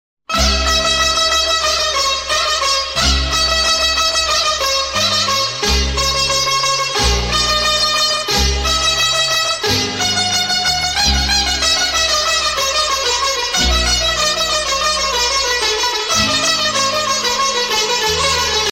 romantic sad ringtone

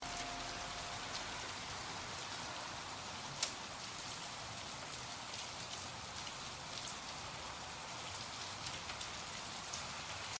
rain sms tone ringtone

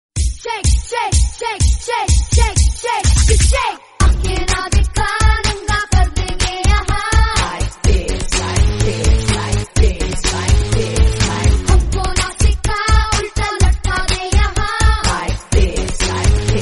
electronica music tone

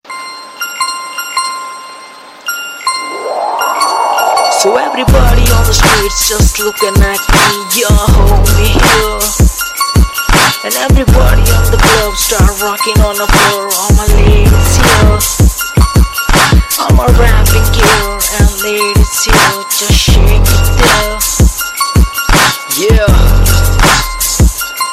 hip hop ringtone

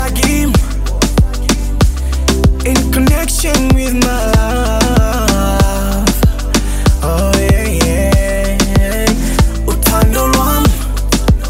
dj remix ringtone

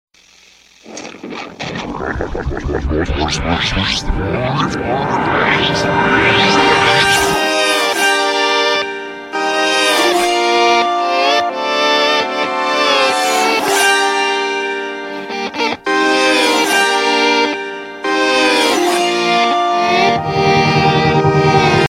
ringtone

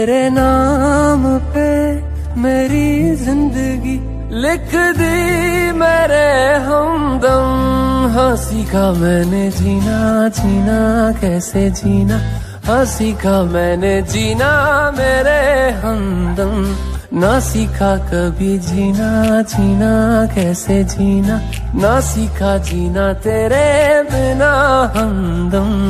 romantic ringtone, bollywood ringtones

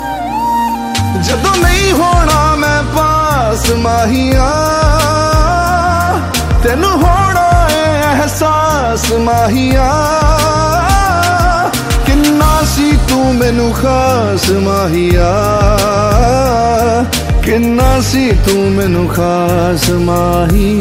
punjabi ringtone

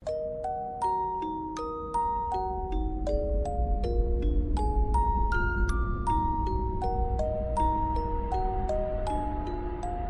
scary house call ringtone; nokia

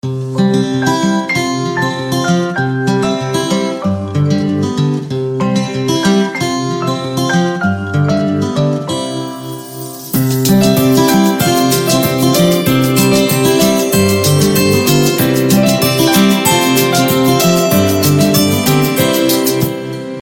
lenovo ringtone

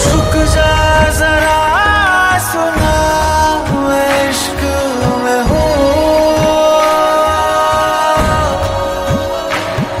hindi ringtone, bollywood ringtones

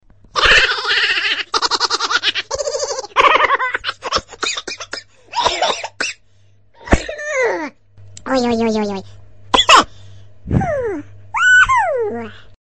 haha ringtone

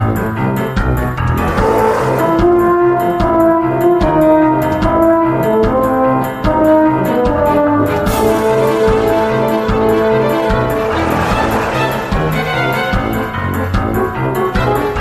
exciting call tone ringtone

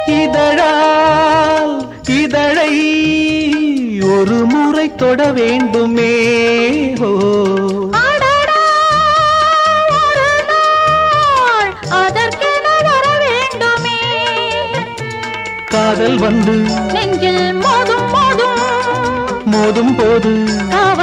tamil ringtone

